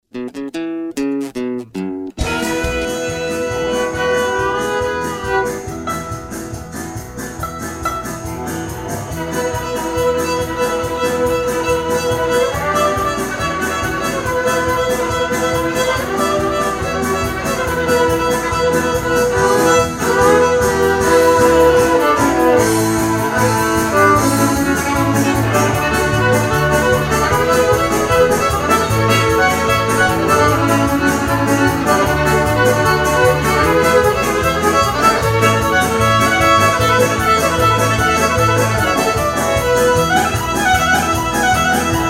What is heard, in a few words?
VIOLIN